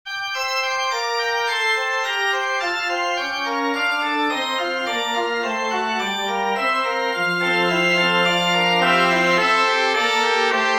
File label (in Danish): nu tændes tusind julelys orgel trompet